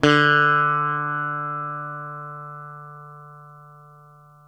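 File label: SITAR D 2 right, coral sitar, guitar; East West; Steve Stevens Guitar Samples Collection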